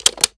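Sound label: sniper reload empty mag release; gmod; iw8; xmike109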